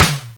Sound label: studio snare one shot c sharp key, Royality free snare tuned to the C# note. Loudest frequency: 2501